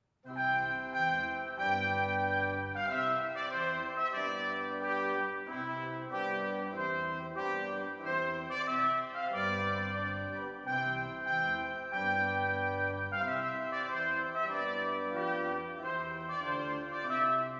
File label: Trumpet Tune